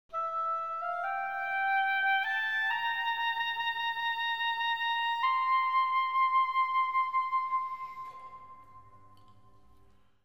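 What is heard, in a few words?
theme melody; Myst III Exile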